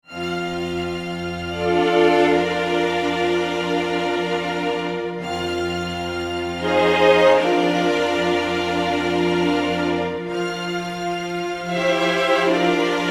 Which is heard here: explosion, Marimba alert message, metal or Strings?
Strings